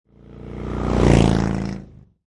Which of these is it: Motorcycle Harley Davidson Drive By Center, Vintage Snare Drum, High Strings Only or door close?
Motorcycle Harley Davidson Drive By Center